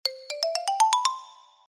軽いノリのアイキャッチ

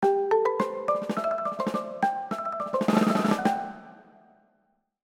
木琴のアイキャッチ

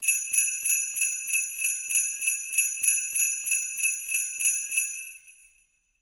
ジングルベルの音

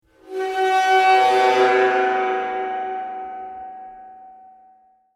心霊的な何かが…いる

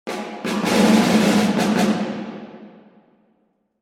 お披露目ファンファーレ

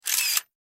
フィルムカメラのシャッター音

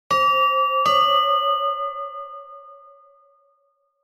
のど自慢

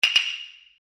火の用心！雅楽の拍子木

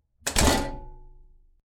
アパートの玄関を閉める音

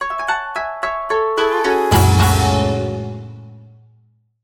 和風よさこいアイキャッチ

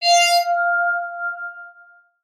嫌な予感

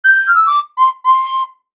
リコーダー

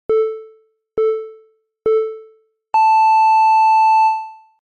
時報

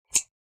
ハサミで切る音

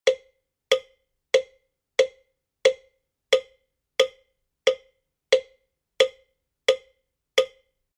木魚と磬子のお葬式セット

